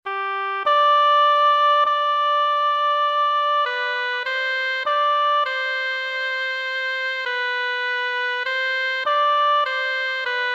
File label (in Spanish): flauta; Epitafio de Seikilos